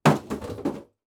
dropping

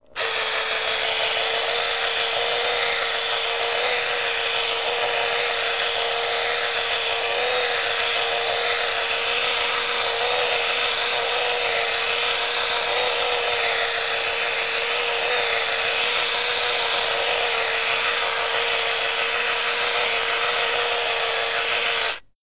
electric shaver

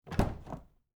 car door open

